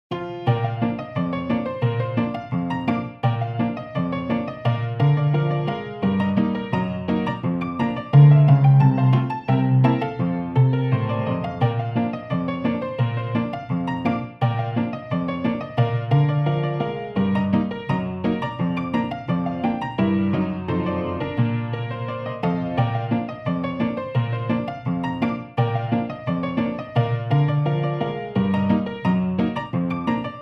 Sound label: Eastern Ragtime Piano